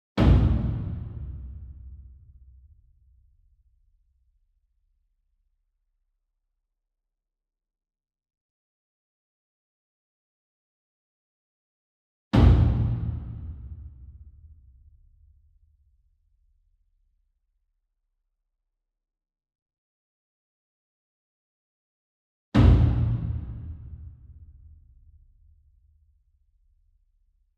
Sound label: SINGLE DRUM Taiko 60 Inch Hit Soft Beater Far; boom library; Cinematic Strikes Construction Kit